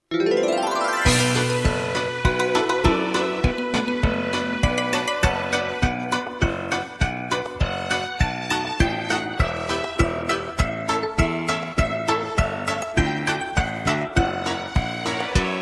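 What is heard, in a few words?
backing track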